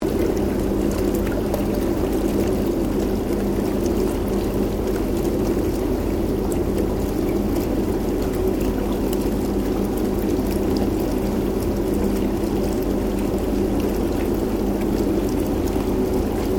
Water Bath Spa Bubbling Loop; Domestic water movement; Water Sound Effects; Nature

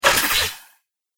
Broken Droid Bits, Robot; Robot Sound Effects

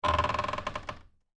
Door Creak Wood, Foley, Door, Creak, Wood

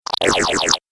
8 Bit Style Laser Machine; Video Game Sound Effects

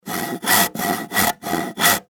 Industry Saw Wood Handsaw; Saws; Machines

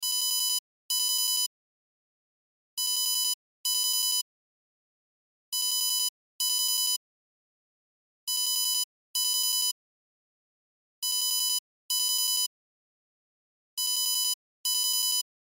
Cell Ringtone; Loop; Cell Phones; Electronics

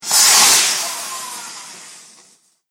Fireworks Wow Rocket Whoosh; Firework Sound Effects; Celebration, Party, Holidays

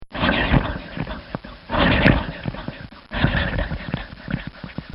Cartoon Sound Effects Shutter

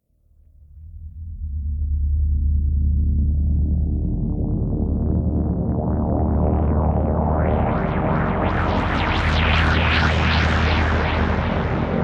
Drone Scifi Tonal Warble Swell Pulsing; Helicopter Futuristic; Sound Design

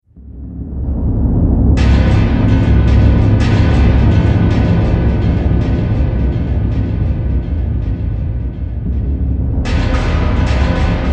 Fantasy Scene Evil Fortress, scary soundscape; Twistedtracks

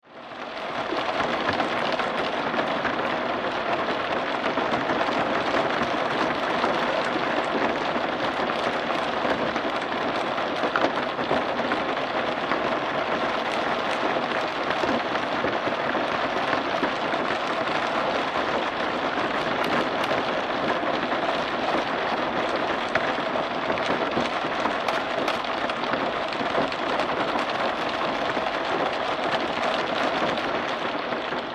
Weather Rain Car Roof Interior Heavy

